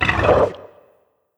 HUSKED CRAWLER damage

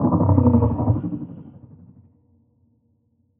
HAMMERHEAD damage

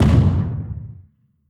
Explosion Small

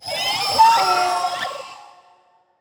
BABYMOLOCH dead

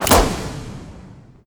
Harpoon Gun

